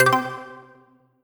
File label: Ticker; CosmicCrazyCash; ClubFusion